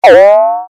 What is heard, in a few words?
good pain; CAC ASSETS